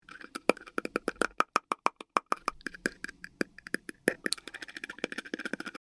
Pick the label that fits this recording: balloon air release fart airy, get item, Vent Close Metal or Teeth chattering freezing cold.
Teeth chattering freezing cold